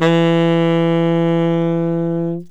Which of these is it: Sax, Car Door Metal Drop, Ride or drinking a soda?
Sax